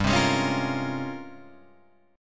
G sharp Major 13th

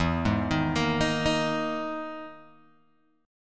E-Major Sharp 11th E, Guitar Open G

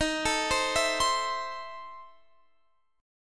E Major 7th Suspended 4th Sharp 5th Eb

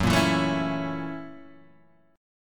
Gb 7th Sharp 9th Gb Guitar Open G

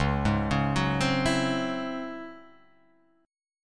C sharp Minor Major 11th C sharp, chord; C#mM11 arpeggiated; JGuitar

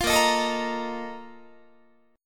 Bb Major 7th Suspended 4th, Guitar

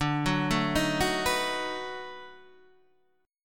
B 7th D; B7/D arpeggiated; JGuitar

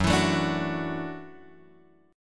F sharp Minor 7th Sharp 5th, Guitar Drop D; chordshape; down

